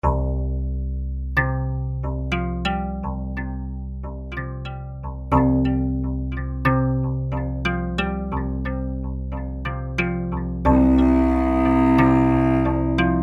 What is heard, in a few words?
Harp Cello; ManyStation